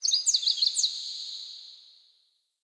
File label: Sparrow Song Dream; Animals; Vocalizations